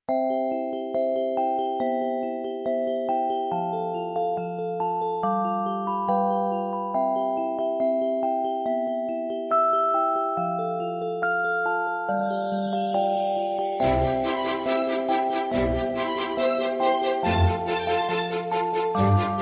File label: Cancion de Navidad Magic Christmas Sound